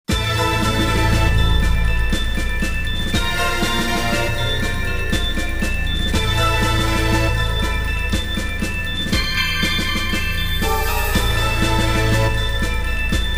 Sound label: Midi; Salamander Portable; PSP; Xexex